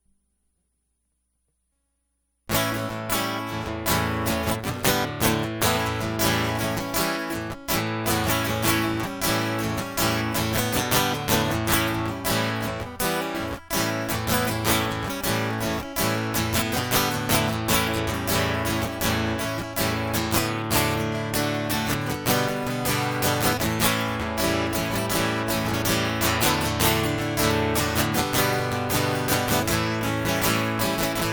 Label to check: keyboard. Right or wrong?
Wrong